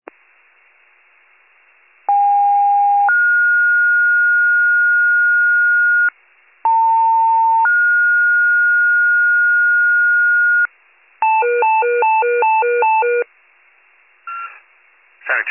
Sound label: fire, pager alert